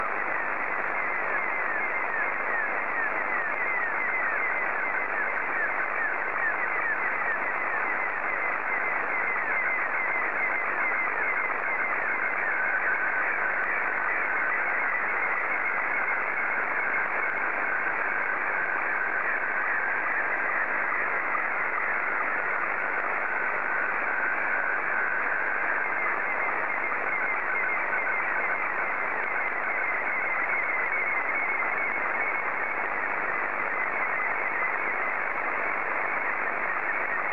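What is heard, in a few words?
SSTV, Scottie 2; JA3OEN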